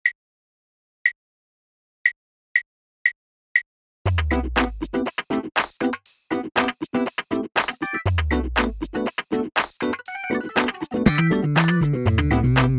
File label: drumless